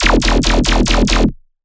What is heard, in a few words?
Dirty Bass E 140, Bass Hits; One-Shots; Ghosthack - Color Bass Essentials